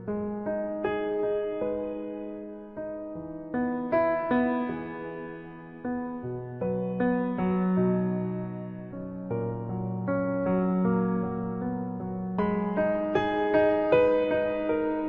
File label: Sad Piano